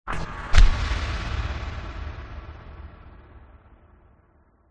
sound design cinematic hit drone boom

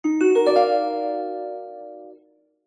cartoon mystery musical tone

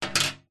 foley money coin set down gently on metal; zapsplat

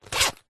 foley luggage bag small fabric zipper fast

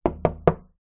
household window knock x3 fast single pane

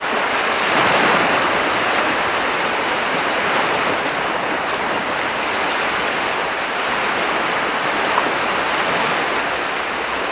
water ocean waves shore constant small; sound effects one

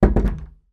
door close gently internal wooden; household; zapsplat

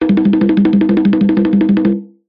cartoon running take off bongos classic; zapsplat

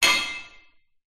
impact metal med bar reverberent; zapsplat

